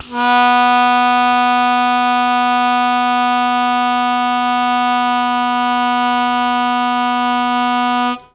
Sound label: Long Sustain B0, Harmonica; Zero G - Harmonica